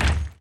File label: knight move; Akeron